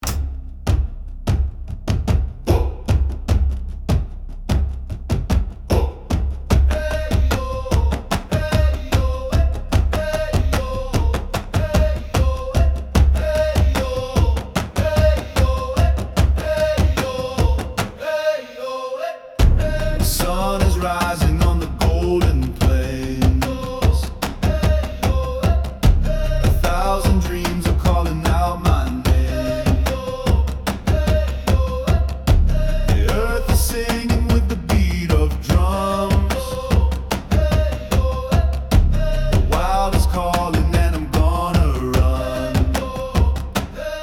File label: Travel powerful savanna sunrise